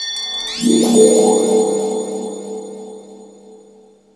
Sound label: THE SPLASH, SYNTH; Q Up Arts; Dream Experience